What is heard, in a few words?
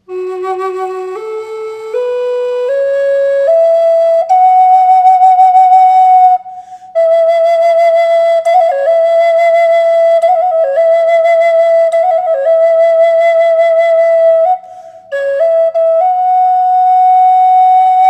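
reverb, flute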